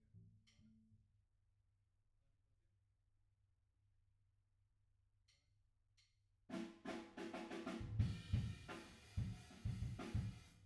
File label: Piano B